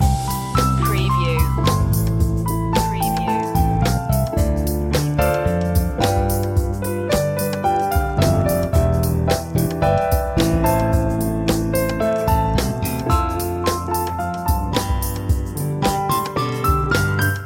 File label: jazzy loop; media music now